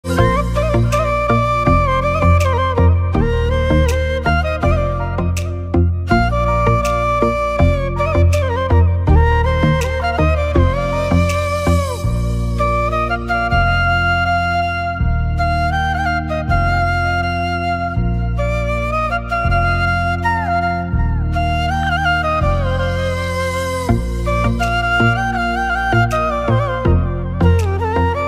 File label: flute ringtone